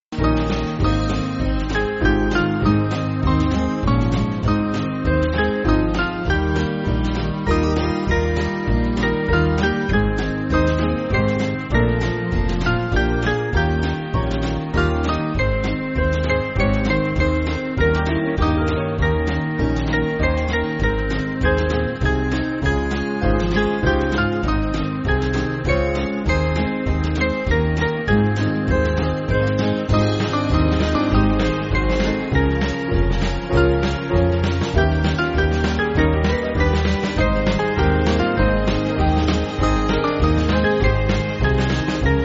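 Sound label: Band, Backing Files for Churches; Of Jesus Love; Morris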